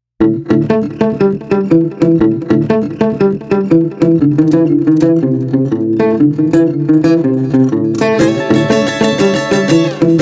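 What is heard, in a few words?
Street Guitars Acoustic Blues, 120 bpm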